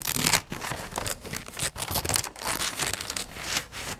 leather belt unbuckled and pulled through clothing